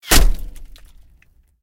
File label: bullet hit outside